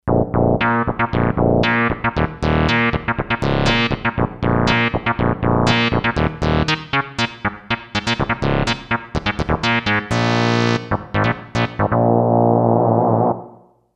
Kawai K5000S; Octavia

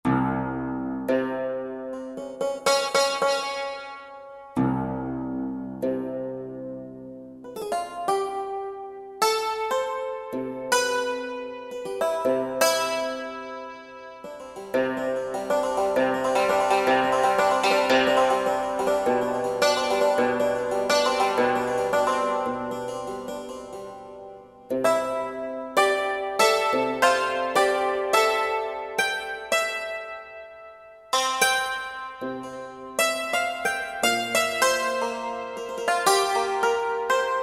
Roland JV-2080; East Europe

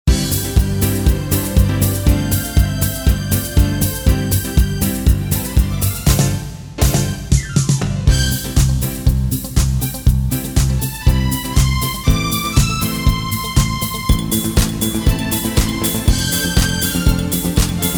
Disco, Casio CTK-601 Rhythms

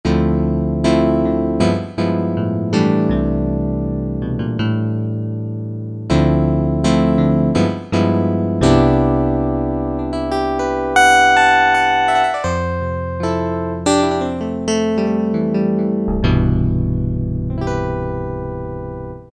Glass Piano; Korg MSC-05S Piano

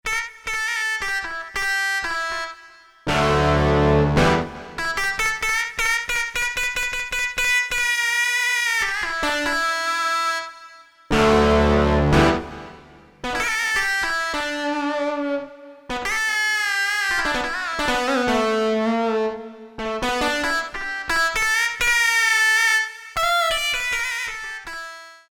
OD Guit; Korg DSS-1; Guitars

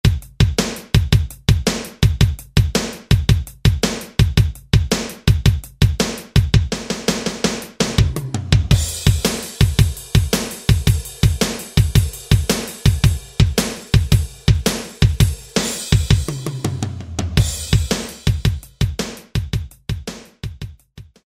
Power Blues, Alesis SR-16

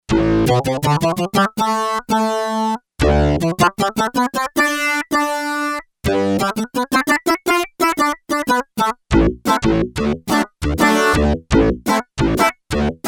Whap-Attack, Yamaha TX802; Performances; Factory Patches

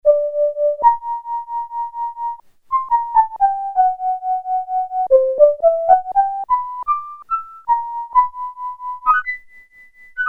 Flute, Korg PolySix; Factory Presets Bank B

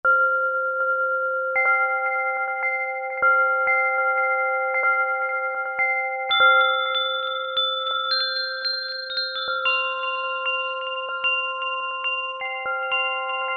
Bell Ring, Korg MSC-10S Ethnic

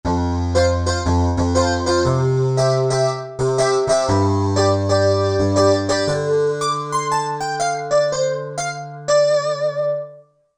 2 voices; Korg MSC-12S Synth 3

